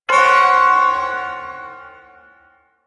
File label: Shawzin Hirajoshi Chord; Warframe; Duviri Ersatz Shawzin